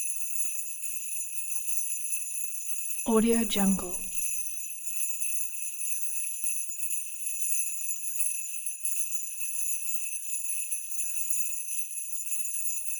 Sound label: christmas loop sleigh jingle bell swirl, 134